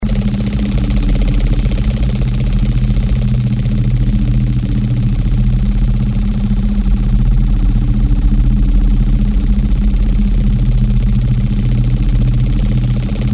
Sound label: Drumming